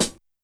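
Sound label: Hi-Hat Pedal Break; YAMAHA RM1X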